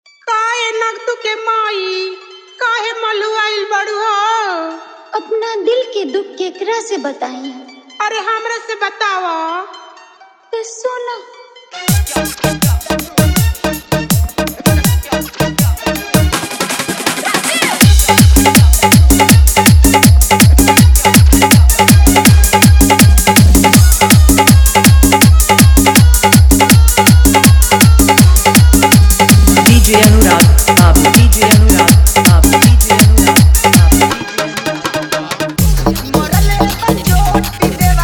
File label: Fully Dhollki Bass Mix